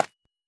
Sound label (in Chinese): 方解石放置声